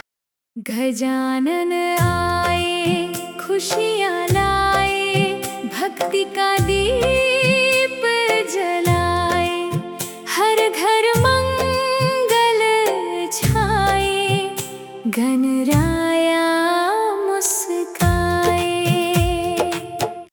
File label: Hindi , ringtone; ganesh